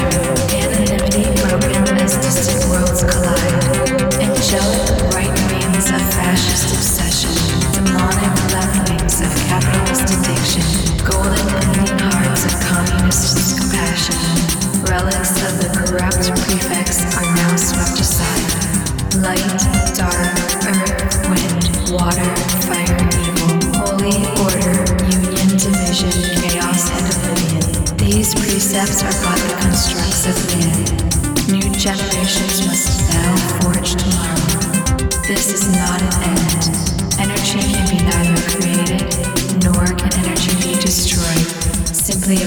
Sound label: Retro Synths